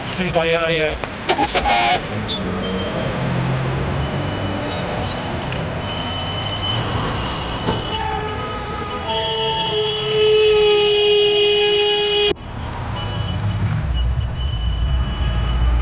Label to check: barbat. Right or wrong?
Wrong